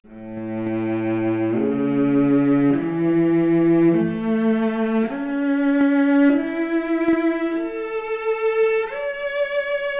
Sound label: Violoncello arp, mp Stereo output noised, 3bit